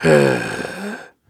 Grunts and Chants, Viking Vocal

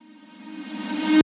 Bitspeak Tonal Reverse - D#; House; FX

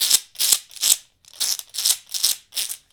wood rattles

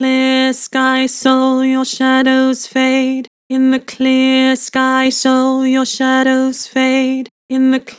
Vibrato, Vocals; Clear sky soul; Harmor Vocals

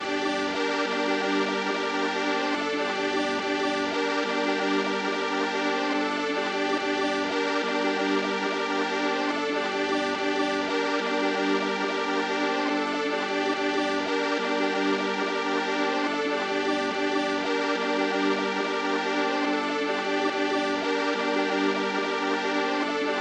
142 BPM G Min Strings; Drill; Cymatics - 2022 Melody Collection - Drill; Peng

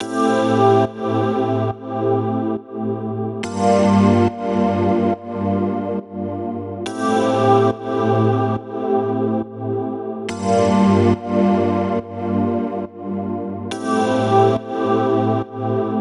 DX Piano Am7 70Bpm; Lo-Fi; HipHop; Construction Kit; STEMS; Ghosthack; Ghosthack Advent Calendar - Day 4 - Lo-Fi Kit

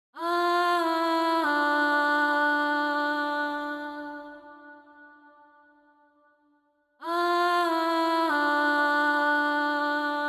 140 BPM D Min Vocals, Drill; Cymatics; 2022 Melody Collection - Drill; Empty The Clip

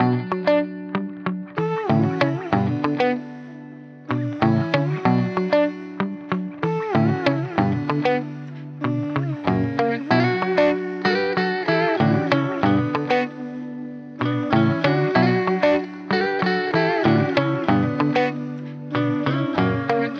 95 BPM B Min, Guitar; Breeze; Cymatics; Miami Guitar Pack - Pt 1

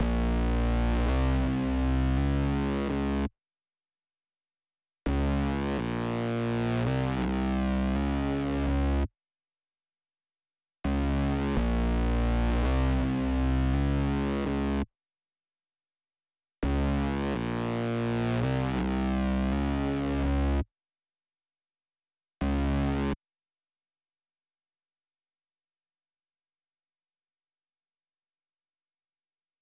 166 BPM G# Maj Bass; Melody; Loop Stems; Cymatics; 2022 Melody Collection - Wavy; Foreign

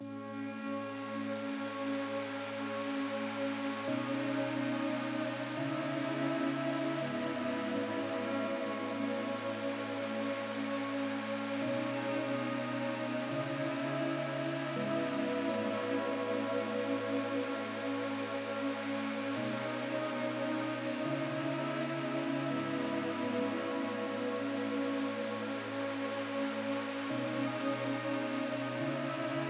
124 BPM C# Min Pad, euphoria; Melodies; Loop Stems; Cymatics; Cymatics - GHOST (Beta Pack); Come Back

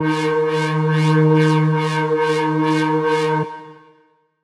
Riddim Melodic Hit D# Spy; One-Shots; Ghosthack; Riddim Essentials Volume 2

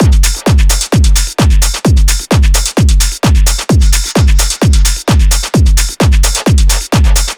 Drum Loop 130, Techno

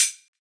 Open Hihat; Cymatics; Humble Hip Hop Sample Pack; Cymbals

